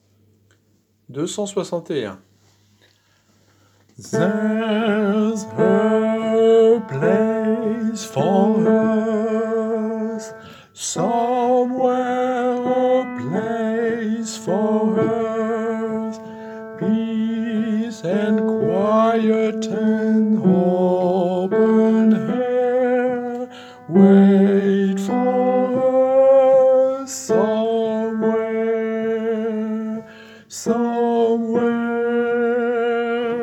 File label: tenor